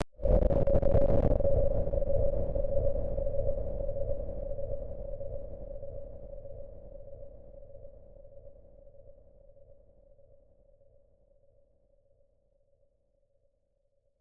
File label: Obelisk Destruction